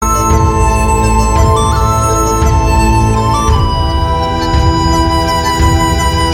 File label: chinese bamboo flute; jae meng